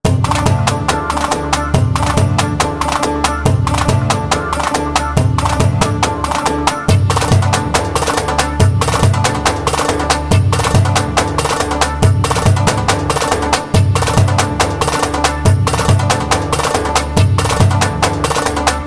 2telli, ritim ciftetelli

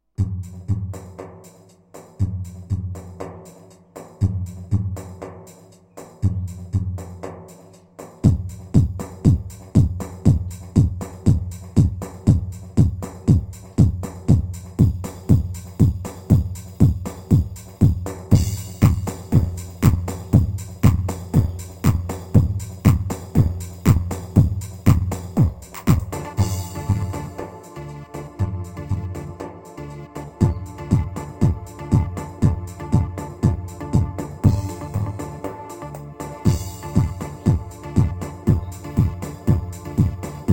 pa 500 tr semah, 2 4 ritim

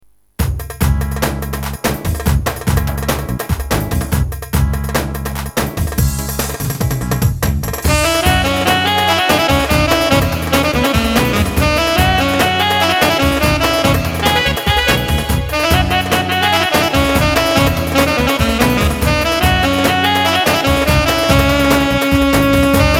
roman, korg i30 9 8

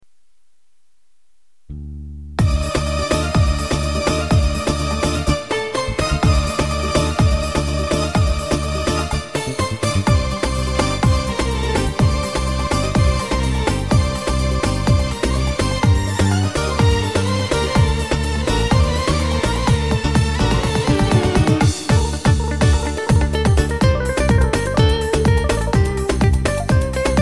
taverna 2 4, ketron sx3000 ritimleri

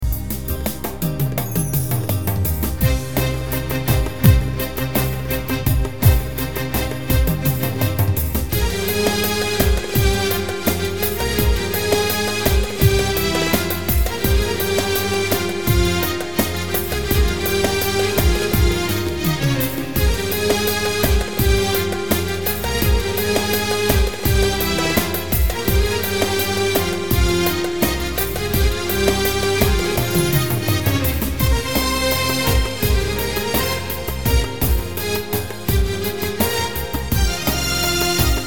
vahde arabesk; korg pa500 tr

